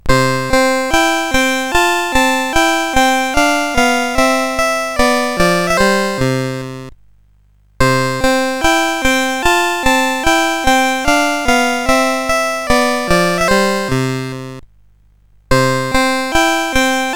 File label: Buggy, CSG8580R5